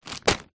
food potato chips crisps large bag set down on surface; zapsplat